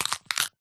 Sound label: egg crack, turtle; mob; Minecraft